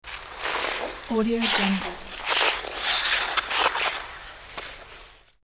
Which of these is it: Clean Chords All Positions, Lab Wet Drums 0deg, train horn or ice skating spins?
ice skating spins